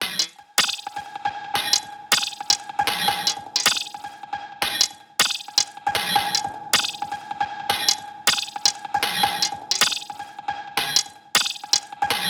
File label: perc 156; Drum Sample Pack; Loops; SD SEISMIC; Slate